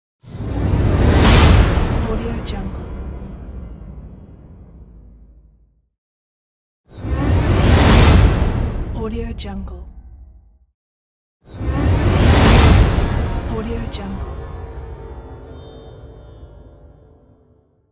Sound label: horror strings